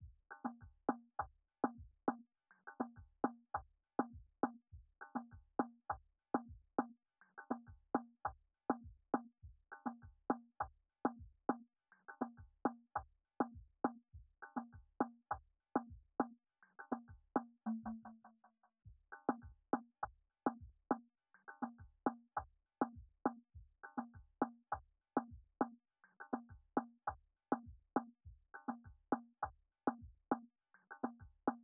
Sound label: Percussion, 102 BPM B Min; Cymatics; Infinity Melody Collection; Frustrations